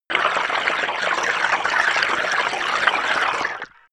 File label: tap water run; gastronomie; berufe